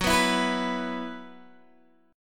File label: Gb 7th Suspended 4th Gb down Guitar Open G, chord